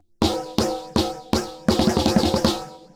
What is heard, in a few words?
phaser pitch; PHYSION